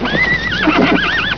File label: neigh